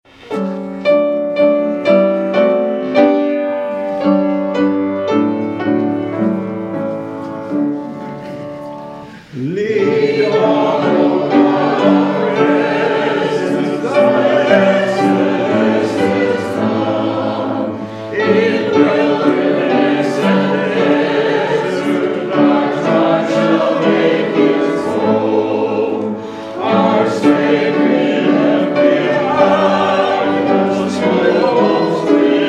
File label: Hymn; Lead On, O Cloud of Presence